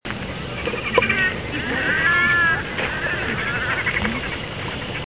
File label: red necked grebe; Birds; Grebes